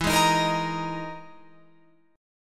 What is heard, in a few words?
E Major 7th Flat 5th E, Guitar, Open F; 16,15,15,17,18,17; strummed